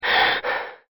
breath in

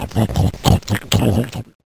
gobble

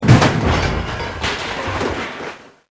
metal

